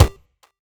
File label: Kick, Drum Hits